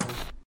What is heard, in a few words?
turn TV on; Lethal Company